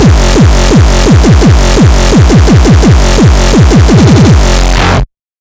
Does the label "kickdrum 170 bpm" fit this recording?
Yes